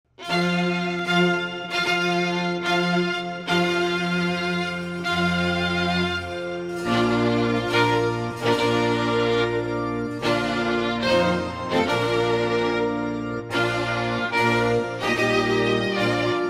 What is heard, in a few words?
Strings Bridal Chorus